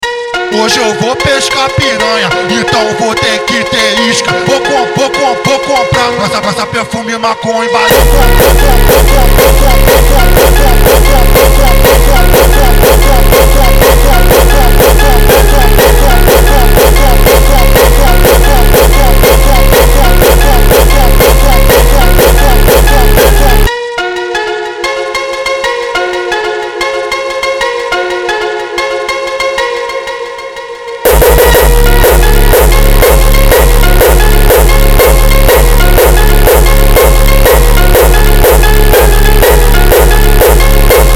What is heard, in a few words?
(hardstyle) Speed Up